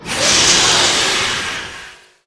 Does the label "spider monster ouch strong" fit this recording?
Yes